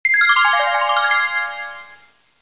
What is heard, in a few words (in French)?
Son sorciere